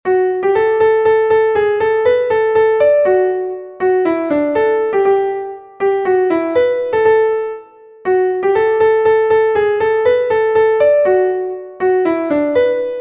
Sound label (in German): klavier; MelodieMeister